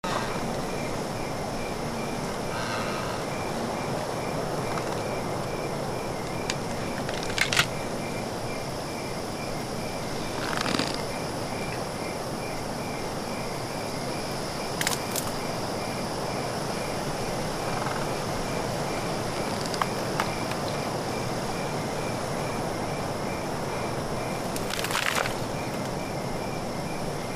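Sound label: Ambience Night Forest Wood Creaks Loop; the unfinished swan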